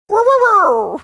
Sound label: making funny dog sound